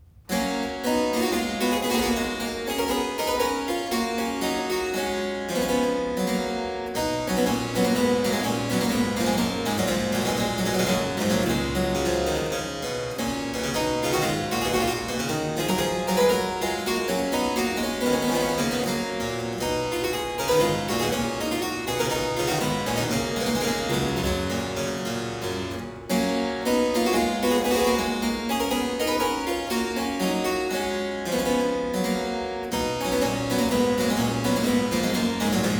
Menuet in G major, Keyboard Works; J.S. Bach; BWV 843; Johann Sebastian Bach; Inventionen & Sinfonias

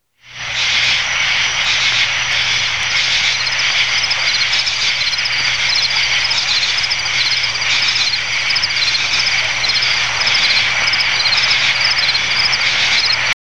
Insects In Swamp; Halloween Sound Effects

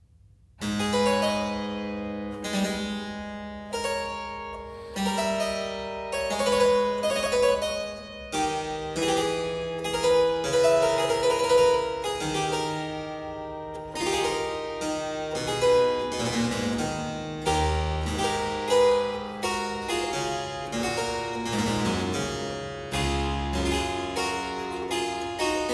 Praeambulum in A minor, Keyboard Works; J.S. Bach; BWV 931; Johann Sebastian Bach; Inventionen & Sinfonias

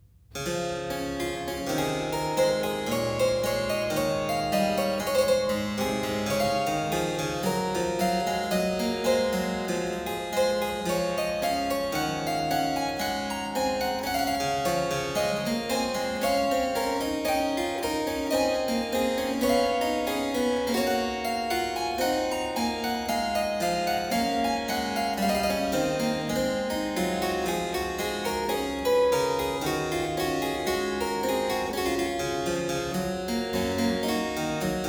Praeambulum in D major, Keyboard Works; J.S. Bach; BWV 925; Johann Sebastian Bach; Inventionen & Sinfonias

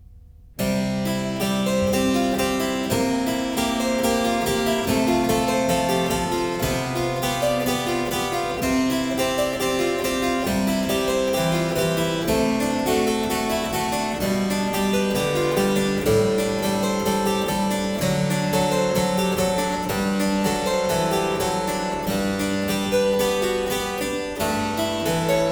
Prelude & Fuge in C sharp major, Keyboard; No.3; BWV 872; Johann Sebastian Bach; The Well-Tempered Clavier

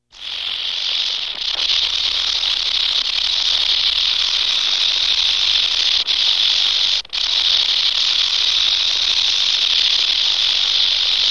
Rattlesnake; Halloween Sound Effects

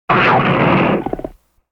Cougar Snarling; Halloween Sound Effects

